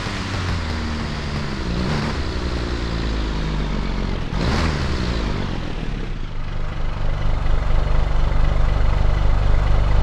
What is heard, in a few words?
throttle off, vehicles; vswat